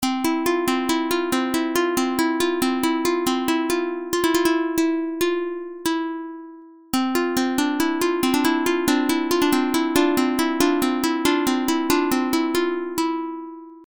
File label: Plucked String; Funk Jazz; Piano patterns used in the composition